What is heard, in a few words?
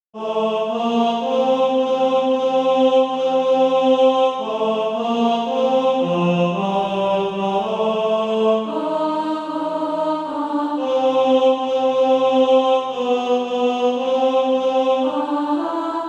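tenor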